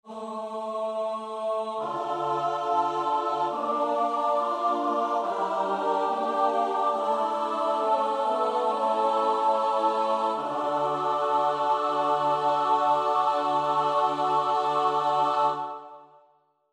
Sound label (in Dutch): S-A-T-B, koorpartij oefening; Schütz (Heinrich); Matthäus Passion SWV 479